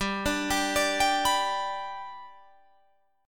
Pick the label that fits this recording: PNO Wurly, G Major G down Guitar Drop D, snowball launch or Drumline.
G Major G down Guitar Drop D